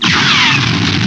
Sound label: SOLO GUN; STARS FXS; East West; Global Sounds